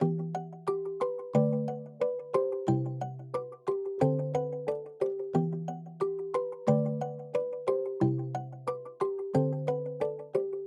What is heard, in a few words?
Nostalgic Melody Loop - 90 BPM E Min Keys; Loop Stems; Cymatics; 2020 Melody Collection